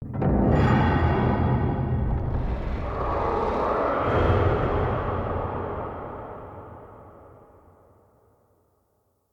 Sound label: Stone Puzzle Activate Far; Puzzles